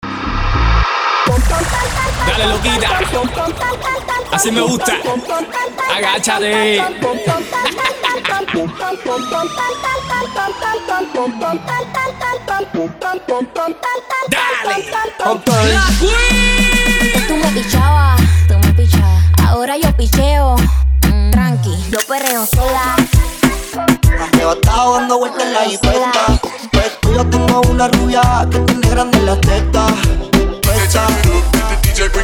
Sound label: Reggaeton Hits; LA 22 vol 3